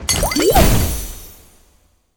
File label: Potions Mixing Alchemy; Legend of Keepers